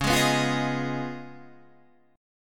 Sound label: Db 9th Db down Guitar Standard; strummed; chord shape; JGuitar